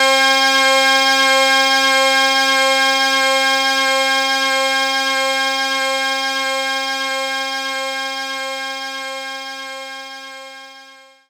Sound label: Bagpipe Drone; knights try